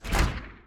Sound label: whoosh star; transitions and movement